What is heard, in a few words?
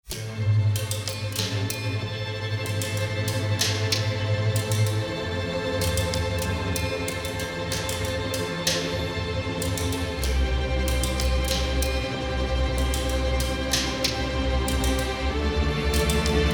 instrumental